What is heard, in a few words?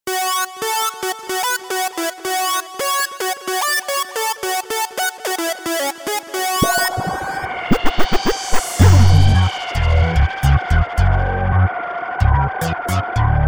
theme short cover version; axel foley